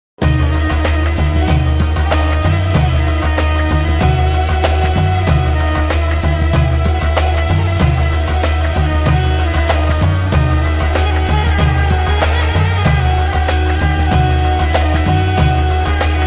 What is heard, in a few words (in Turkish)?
Melodisi zil sesi; Kurtlar Vadisi Pusu